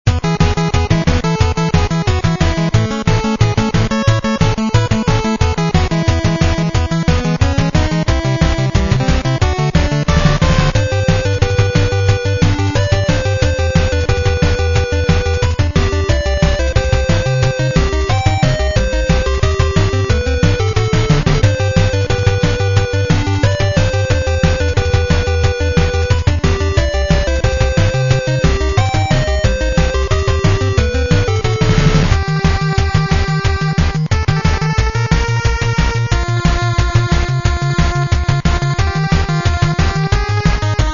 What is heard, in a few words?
chiptune